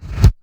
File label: BD, Dance Mega Drums, BD HOU; Best Service